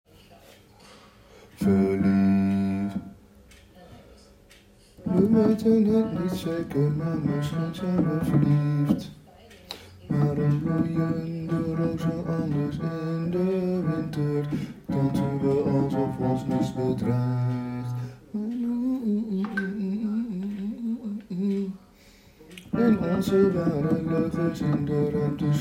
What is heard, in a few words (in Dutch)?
Bassen